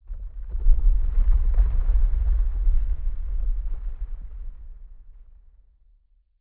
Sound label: rock fall; Rust